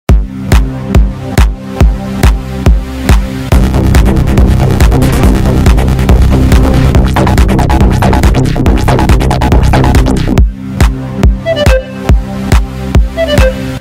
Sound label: Trance